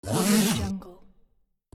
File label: zipper backpack